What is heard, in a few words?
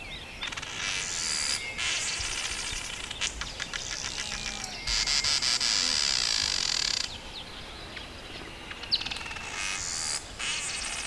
Ploceus velatus